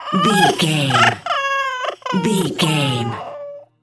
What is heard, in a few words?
goblin fairy cry whimper high